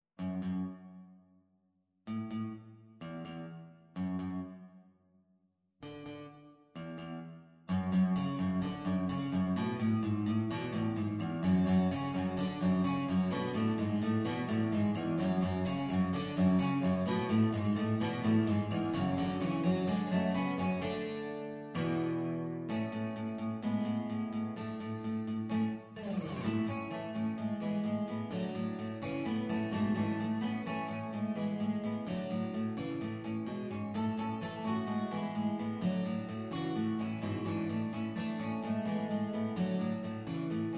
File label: Guitar Ensemble